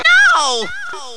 oow

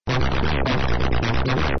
synthesizer

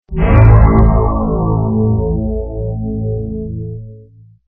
synthesizer

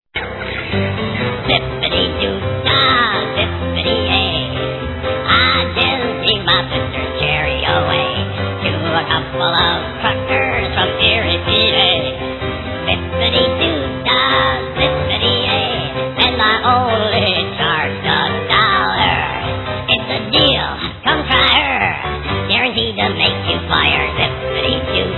zipadee

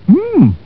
mmmm

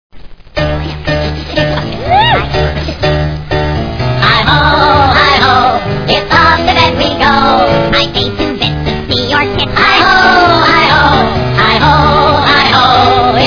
hiho

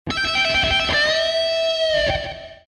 guitar

